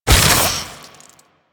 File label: Secondary Barrel Loop End, Weapons; Warframe; SU Modular Guns